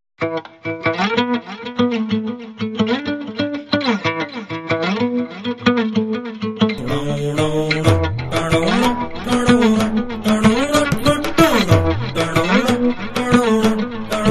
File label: Theme Ringtone